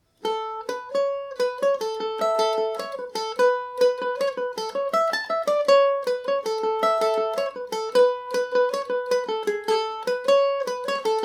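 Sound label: A major, Irish mandolin; mrs galvins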